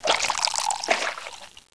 oil workshop; Caesar III

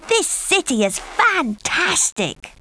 pupils great; Caesar III

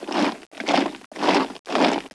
MARCHING; Caesar III

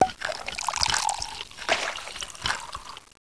wine workshop; Caesar III

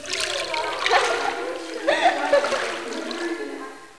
BATHS; Caesar III

